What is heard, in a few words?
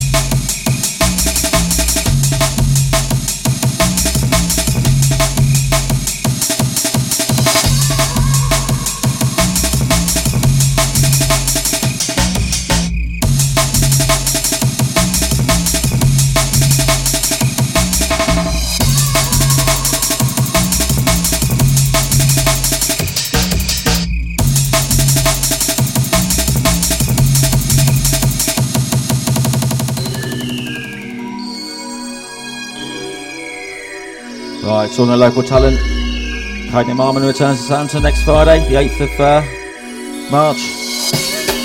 drum and bass; RECORDED RADIO